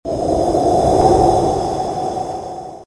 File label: Whoosh